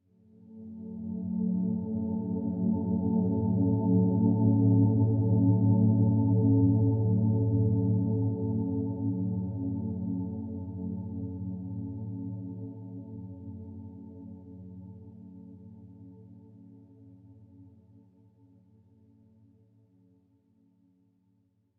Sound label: Swell Tone; HDD Theme Scull Swell Tone 03c; inside